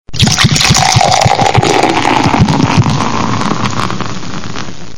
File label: Soda Pop Pour